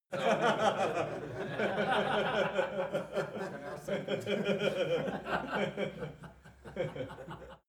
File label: laugh long